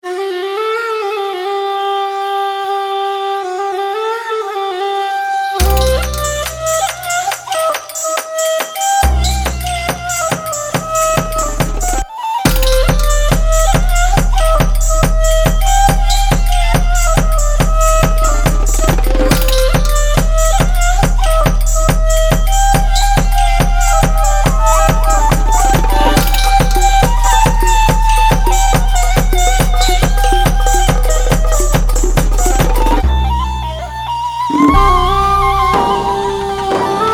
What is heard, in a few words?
Persian Ney; Persian Essentils